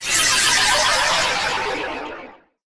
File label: magic chanting